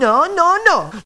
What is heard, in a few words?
LAUGH; BattleSNDS